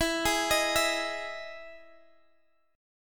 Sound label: E Major 7th, Guitar, Open G; chord shape